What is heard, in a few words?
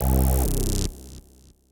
section fail; osu!; Luminance - Fluorescence